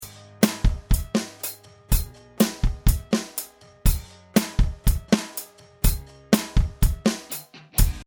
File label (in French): rythme en noires